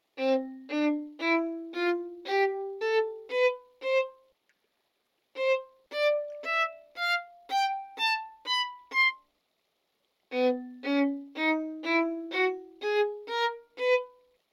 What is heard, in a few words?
Sprightly Fiddle Sound